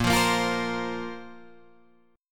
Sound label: A sharp Suspended 2nd, down, Guitar, Drop D